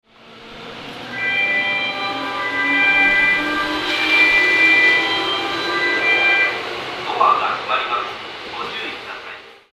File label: mejiro melody, Tokyo Train Tunes; japan